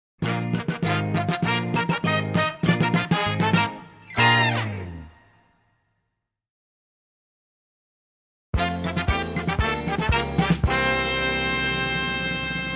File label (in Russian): Shutlivye fanfary